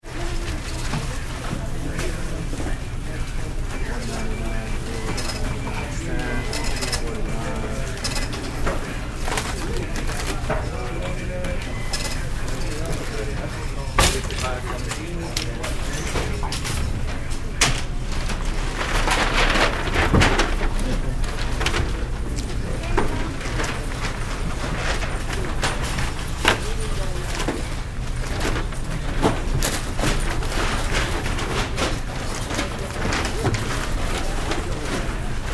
Supermarket Background Ambience, Cash Registers, Very Busy; Many people in checkout line, grocery store ambienc